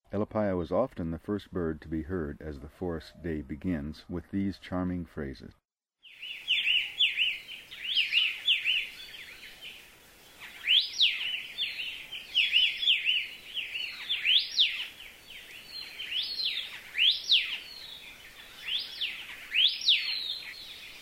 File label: Elepaio, Voices Kauai